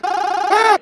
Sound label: ahahahahaahh; the rock